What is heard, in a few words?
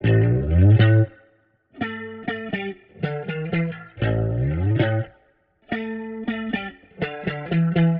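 Psych Funk, Warped Bass, 120 bpm, F; Loops; Touch Loops; CosmicFunk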